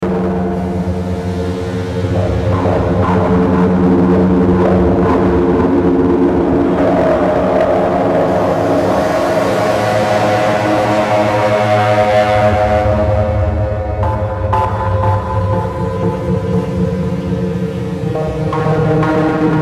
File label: Bed Digital Sweeper Fuzz Eerie; Bed Sound Effects